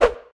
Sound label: Molotov Throw